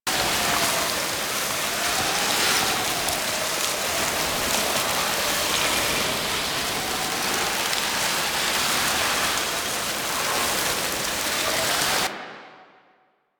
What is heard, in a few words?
Alchemy Grenade Loop Cold; Warframe; Entrati